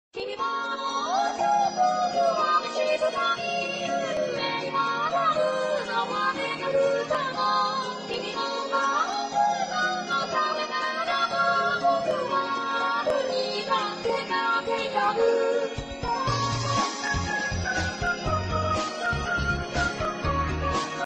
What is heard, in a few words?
electro